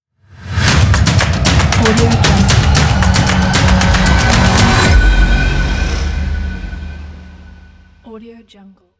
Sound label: stomp and clap trailer cinematic logo